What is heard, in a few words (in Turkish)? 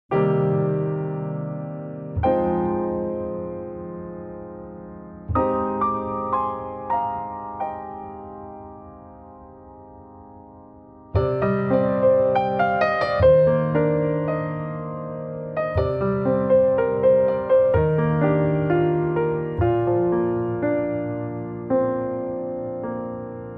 santral fon muzikleri